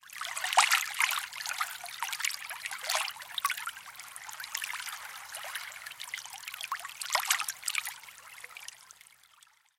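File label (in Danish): NATUR Vandløb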